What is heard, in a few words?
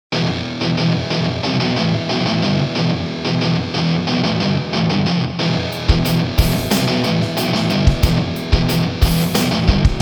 simple bgm